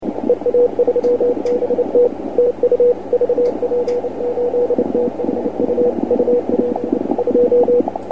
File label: cw